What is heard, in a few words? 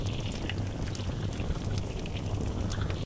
magic torch